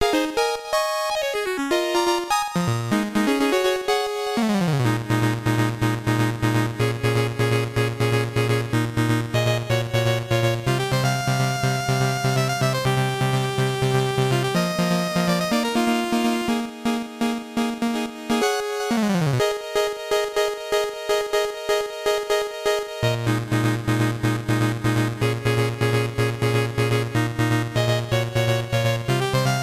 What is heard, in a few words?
BGM, WonderSwan Color; Namco Super Wars